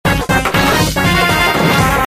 win; tf2ware; warioman

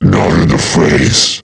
attack; tf; slender; kfxmas snowhusk

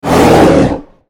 spellcast effort; Volibear

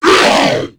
stun; tera creatures; abomination guard

